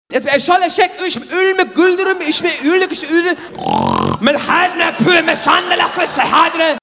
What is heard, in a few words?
grrr